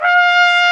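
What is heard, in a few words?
Big Band Fast, trumpet; Roland L-CDC-01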